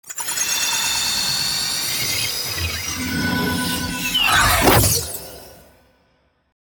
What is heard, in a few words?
Magical fairy attack spell